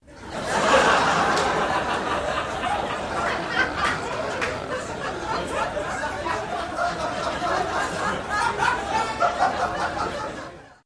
Laughter Med Crowd In; medium

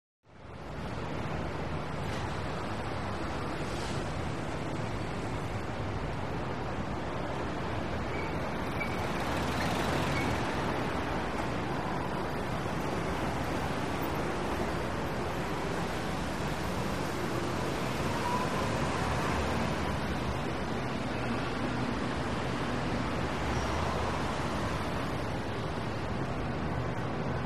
City Traffic Heavy

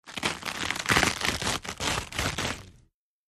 Lettuce Crunchy Rip, kitchens

